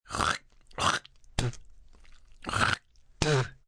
Hork Double Spit, Spitting/Horking, hork and spit x 2; human voice